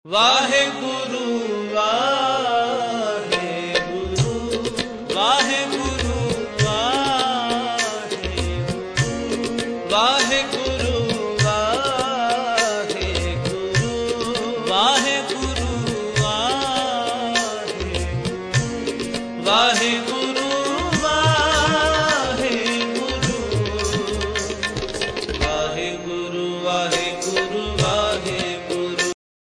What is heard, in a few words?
Waheguru Waheguru Religious Ringtone